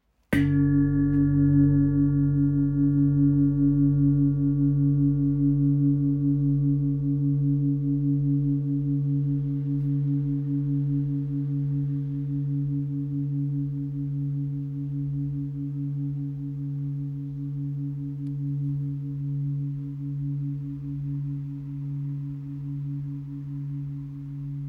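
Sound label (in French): Gros bol do dièse 9.6kg, Bol chantant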